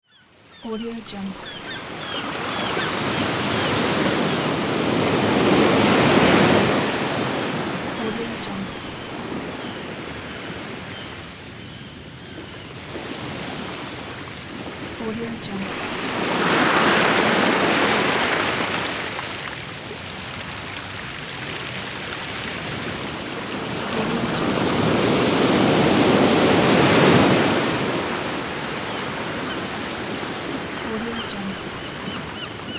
sea waves with seagulls

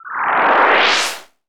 super power teleport appear

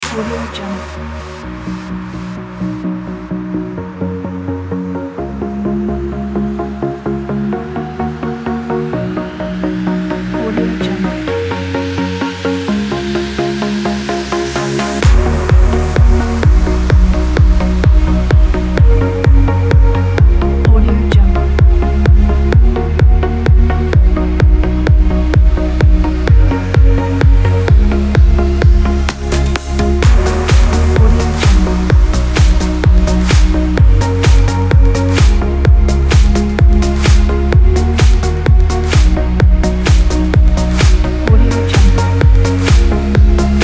electronic

